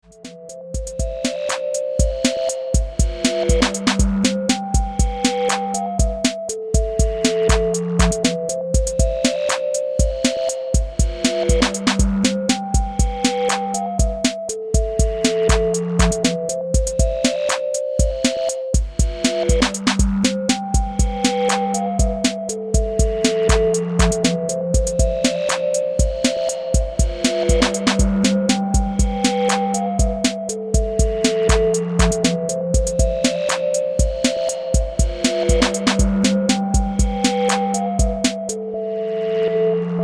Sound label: Jam; Mega Synthesis